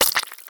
Water Splasher; gamerip

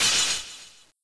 power up; Touhou Jitsuzaisou Dream Logical World

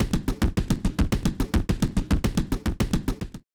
Chest Beat Loop; gamerip; Dung Defender

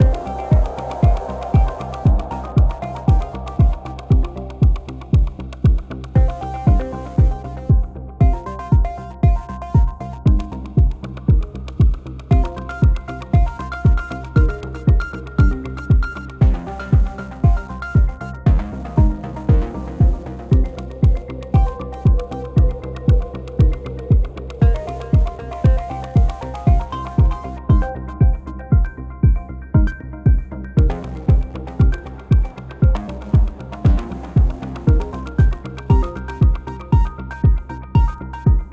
Hacking Loop 24 Bar 117 Bpm; gamerip

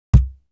bass drum

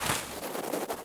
Centipede Burrow; Hollow Knight (gamerip) (2018)

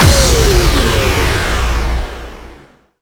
Shutter

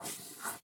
Crayon Door-Open

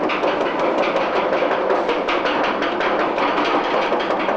Chain Lift

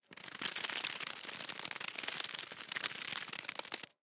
Animal Insect Bug Movement Centipede Legs Walk

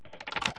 Weather Disruptor Lever

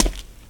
Foot Step

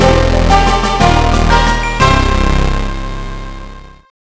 Fanfare Get Weapon; Sigma Star Saga; GBA

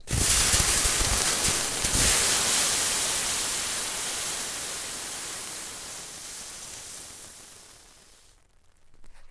Sizzles

